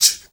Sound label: male solo, male noise; Heart of Africa; Spectrasonics; Heart of Africa 1